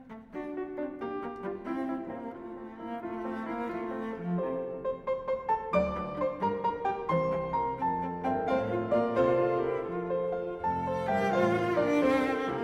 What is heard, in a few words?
Sonata for Cello and Piano in F, Rondo, Allegro vivace